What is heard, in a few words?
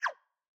return click